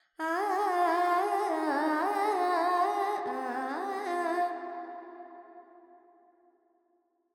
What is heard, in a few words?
Pentatonic, Vocals Carnatic Female Alaps 70 G; Sample Banks; Verb